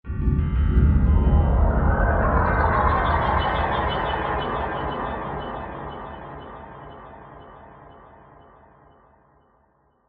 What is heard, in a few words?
Synth, Stab, Reveal, Mysterious; Stabs; BlastWave